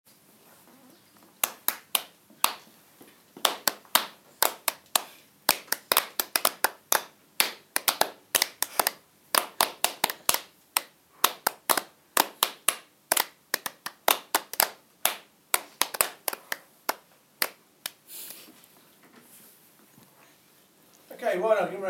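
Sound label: clapping piece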